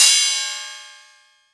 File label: Cymbal